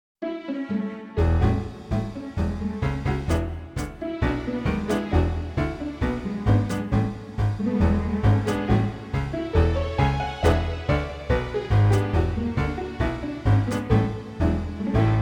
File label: Strings met pizzicato